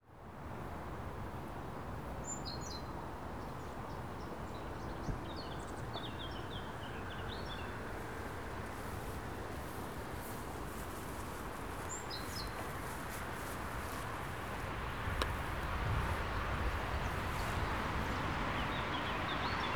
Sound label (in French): moineaux; rendez-vous avec les oiseaux